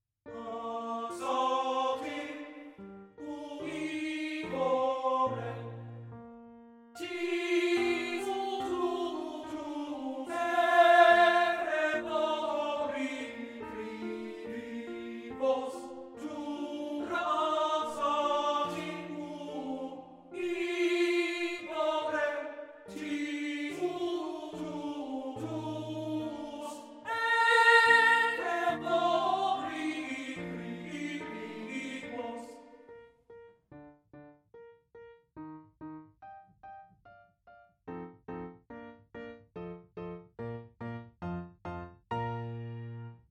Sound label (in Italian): lied per voce e pianoforte; Rosetti; An Mutter Natur